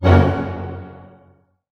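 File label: F# major pianissimo, orchestra hits; Sound On Sound - Peter Siedleczek's Orchestra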